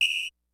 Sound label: HOUSE KIT, DRUMS; Kid Nepro; Elite